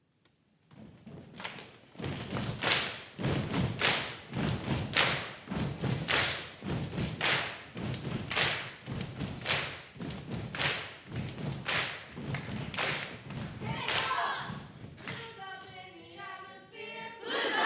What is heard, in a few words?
Cheer